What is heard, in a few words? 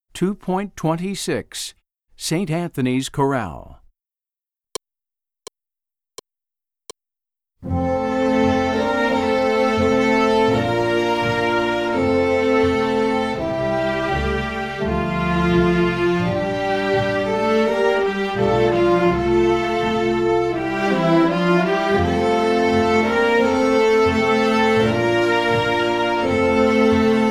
Chorale